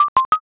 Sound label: chip